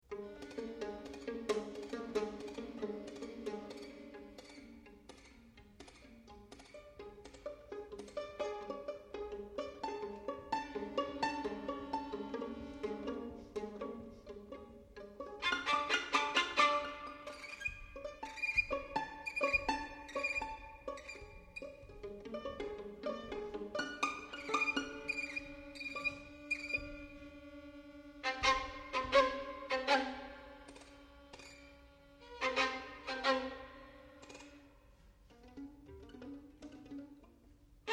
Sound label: Trio Violinissimo